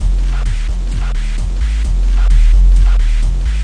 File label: industrial supahbass